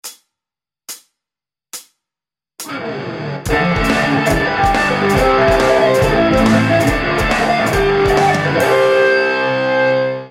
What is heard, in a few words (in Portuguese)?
arpejos